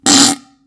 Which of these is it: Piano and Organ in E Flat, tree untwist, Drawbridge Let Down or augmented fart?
augmented fart